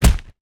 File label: body hit small